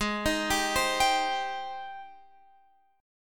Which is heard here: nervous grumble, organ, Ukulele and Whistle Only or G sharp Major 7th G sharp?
G sharp Major 7th G sharp